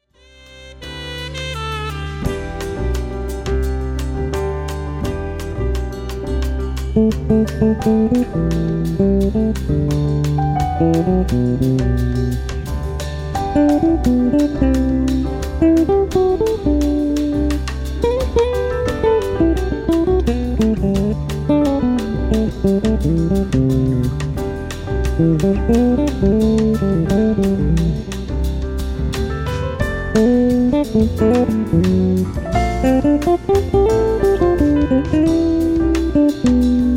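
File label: Six String solo, bass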